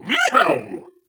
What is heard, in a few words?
ballbot somersault; Portal 2